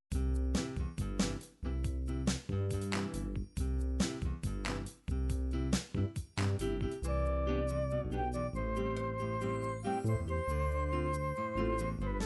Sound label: bossa nova, midi